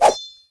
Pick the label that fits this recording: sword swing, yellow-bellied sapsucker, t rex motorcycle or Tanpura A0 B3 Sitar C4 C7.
sword swing